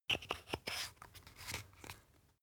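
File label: toothpaste close, Bathroom; haus-garten; badezimmer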